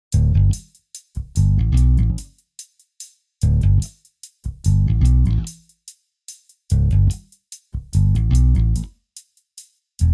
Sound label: bass hat